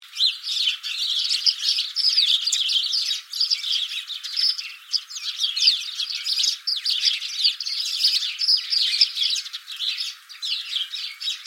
wrobel glosy stada

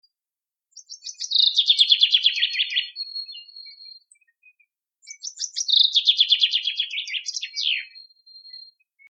zieba spiewajacy samiec

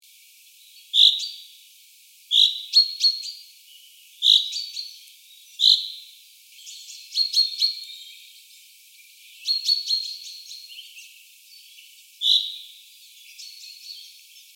zieba inne glosy

